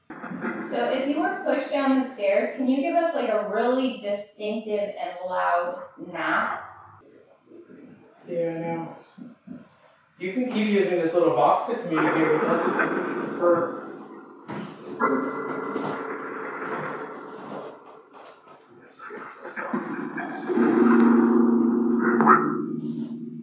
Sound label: 2nd Floor Bedroom PHASMA; brookline private residence investigation